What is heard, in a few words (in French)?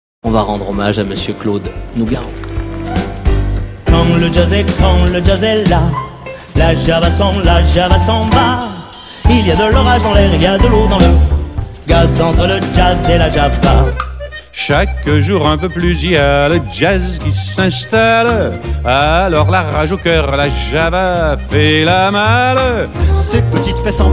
Chorale